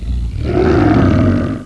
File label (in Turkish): kaplan kükreme; hayvanlar